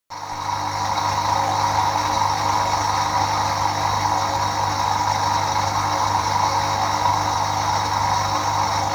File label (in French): lave vaisselle bosch bruit de pompe; panne; sgs43e62eu